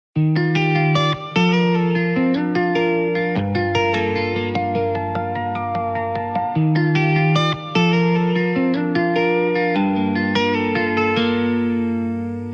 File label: tape wobble; warmth dev